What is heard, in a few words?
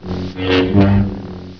saber miss, weapons